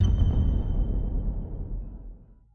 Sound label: Achievement; Hazardous Space